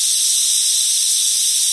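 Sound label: gas release loop, ambience; video games; source engine; portal2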